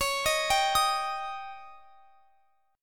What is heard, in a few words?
C sharp Suspended 2nd Flat 5th C sharp; C#sus2b5; arpeggiated; x,x,23,20,20,23